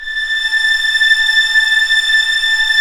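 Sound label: violins, piano, orchestra; String Master Series; Vlns 7 Orch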